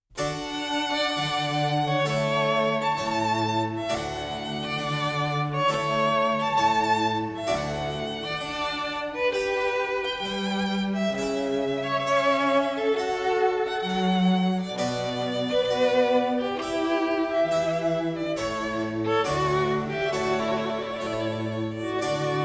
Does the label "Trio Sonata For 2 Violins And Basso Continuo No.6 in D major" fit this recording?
Yes